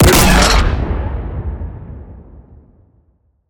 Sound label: skewer